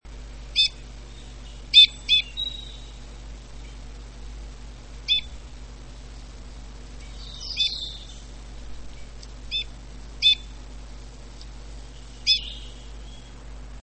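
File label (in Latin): loxia leucoptera; krzyżodziób modrzewiowy - nawoływanie1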